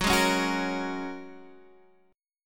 Bb Minor 7th Sharp 5th Gb

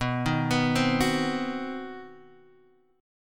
C Minor Major 7th Flat 5th B, chord

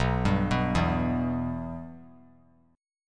B Suspended 4th Sharp 5th B; Bsus4#5 arpeggiated; JGuitar

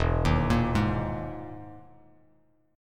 E Major 7th Flat 5th down Bass Standard; EM7b5 arpeggiated; JGuitar

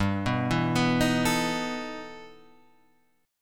E Minor Major 11th G, guitar

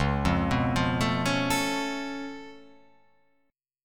C sharp Augmented 7th, C sharp, down

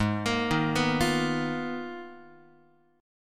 G sharp Minor 6th Add 9th, Guitar; arpeggiated; 4A,4E,5A,5D,5G#,6C#; x,4,1,1,3,4

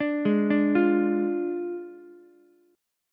D Diminished D, Ukulele; arpeggiated

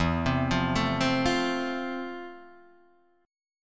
F Major, guitar chord

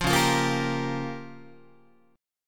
C sharp 6th Flat 5th C sharp down Guitar; strummed; C#6b5; JGuitar

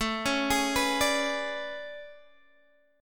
A Major 11th, down Guitar Open G

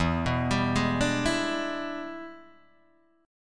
E 7th Sharp 9th Guitar Open D, chord

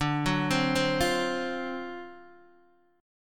D 7th Sharp 9th D down Guitar Open D

